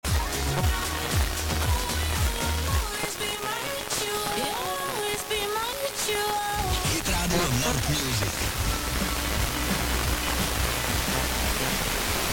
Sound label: Hitradio Music; CZE; Chomutov